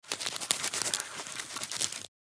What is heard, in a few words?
Insect little monster scuttling tiny movement